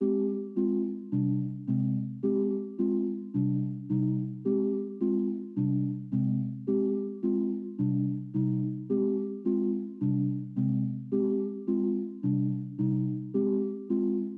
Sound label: 108 BPM A Min Mellotron, loop stems; Melody; Cymatics; 2022 Melody Collection; Wavy; Space Invader